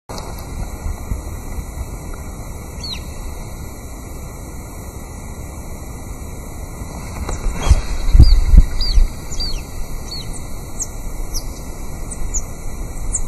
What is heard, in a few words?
Geositta punensis; Ruta Provincial 7; Jorge La Grotteria